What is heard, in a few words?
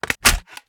reload xmag mag in; gmod